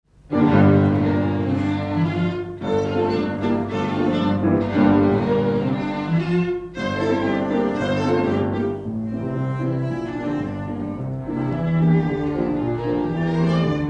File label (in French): QUATUOR AVEC PIANO VIOLON ALTO ET VIOLONCELLE Allegro; ROGER DUCASSE